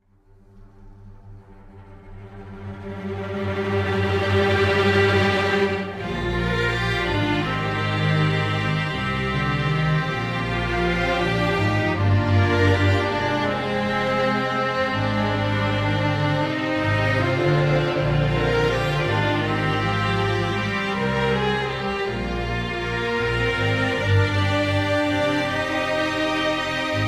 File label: Instrumental